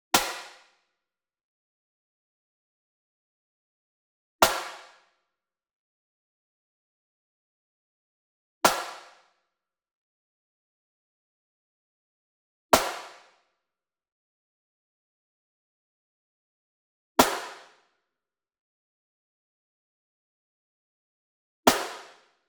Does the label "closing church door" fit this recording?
No